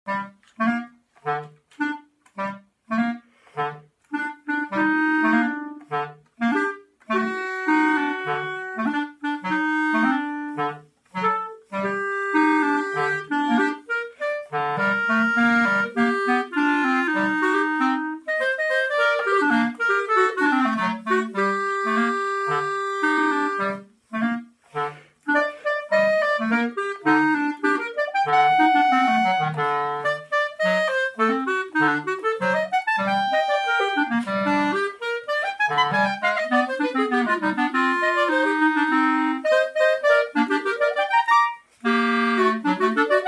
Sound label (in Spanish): SONIDO CLARINETE